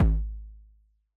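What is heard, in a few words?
Bass Drum; Yamaha DJX-2B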